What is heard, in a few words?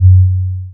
dancemusic